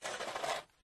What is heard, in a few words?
shovel clearing snow from path; toolmisc; field reports